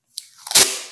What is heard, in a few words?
Tape Ripping